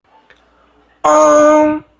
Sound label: dead sound